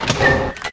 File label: MENU go; fun and bullets